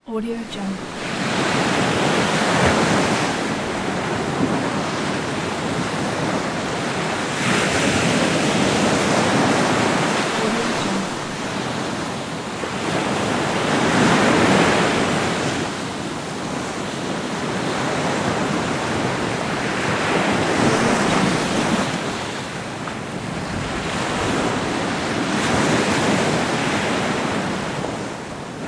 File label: ocean; fx market